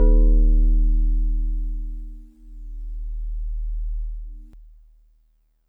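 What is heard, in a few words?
DX100 D sharp 3; David Lynch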